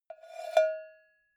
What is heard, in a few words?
cowbell scratch hit soft